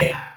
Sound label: screams android